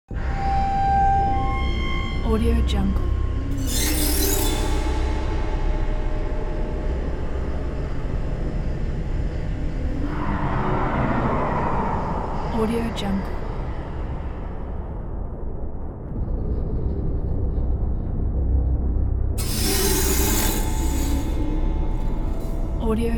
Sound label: dark suspenseful soundscape atmosphere